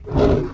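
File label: snort, sonak; giants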